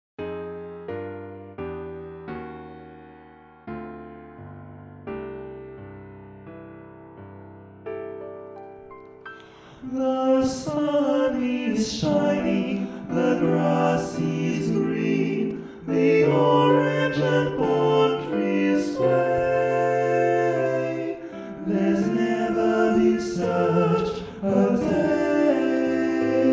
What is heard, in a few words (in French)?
toutes les voix